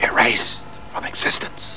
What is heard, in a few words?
ERASE